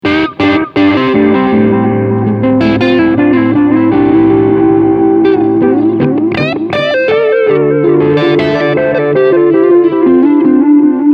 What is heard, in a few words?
Medium Delay Overdrive